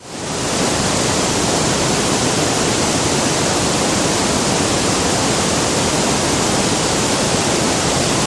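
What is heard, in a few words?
Waterfall Large Loop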